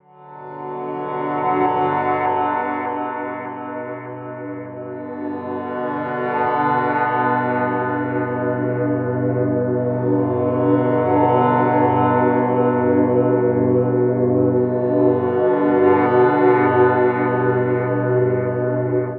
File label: Drone Bm 100; Ghosthack; Celest